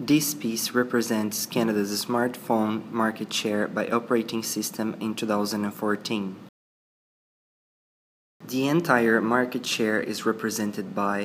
Sonification

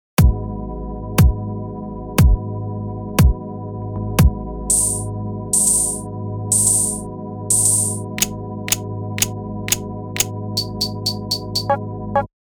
sonification percussive backdrop no legend

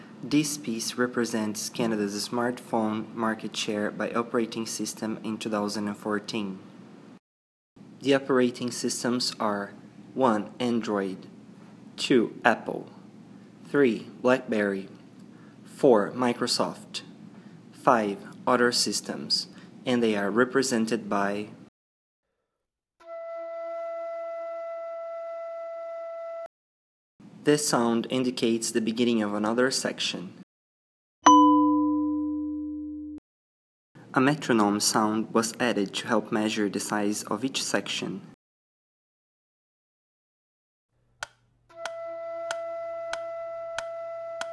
Sonification

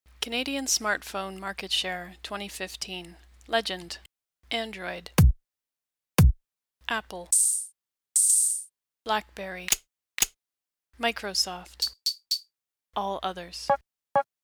sonification percussive R justify with legend

